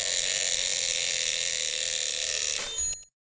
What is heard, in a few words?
bell; baldis basics